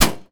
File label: damage; ludumdare40